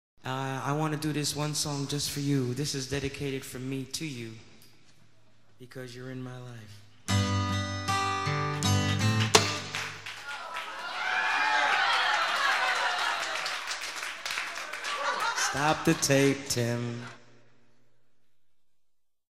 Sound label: broken string